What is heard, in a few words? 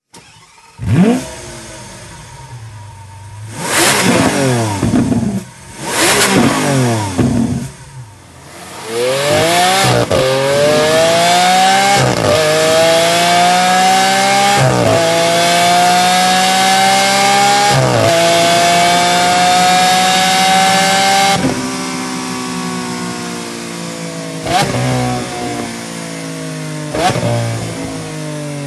stock aventador exhaust sound